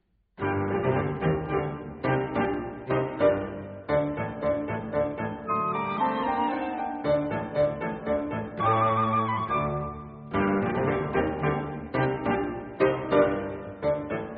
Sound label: Trio for flute, cello and piano Scherzo. Allegro vivace; Weber